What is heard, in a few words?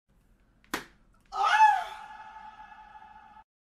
Spanked And Moaning